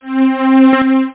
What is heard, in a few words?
heal; ampu 1.01